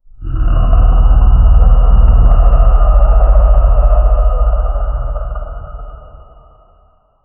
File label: monster